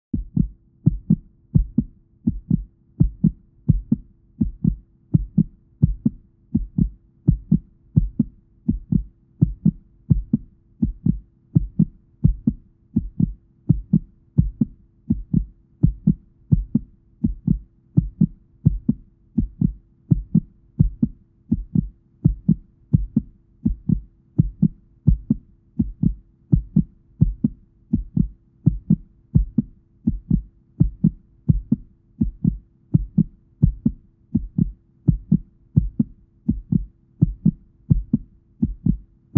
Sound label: Heart